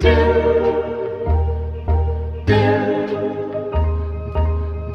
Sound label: voice and piano do do; Loops; Phonky